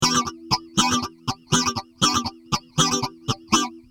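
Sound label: rhythm guitar, phaser; systech phaser2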